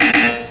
buzzer; Insaniquarium; popcap

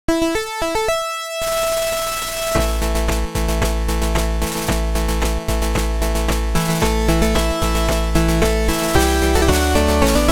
Fanfare; Princess & Conquest

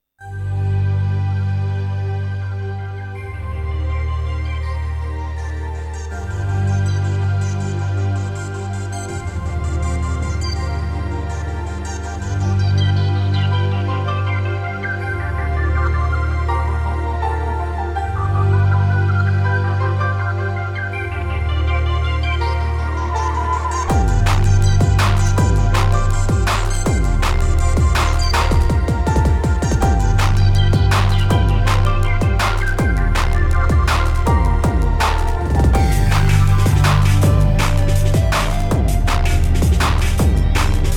Transit Pads; Drunken Robot Pornography soundtrack

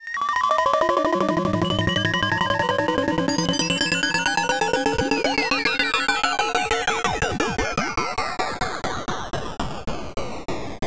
cool mystery; Junkbot

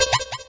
jump; Junkbot

